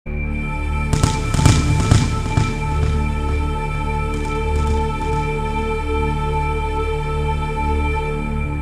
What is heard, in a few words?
Thunder; 1001 Sound Effects; Halloween Scary Music and Sound Effects